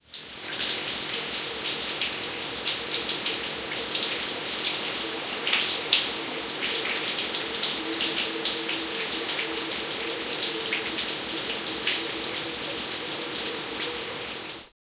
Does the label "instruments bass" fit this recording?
No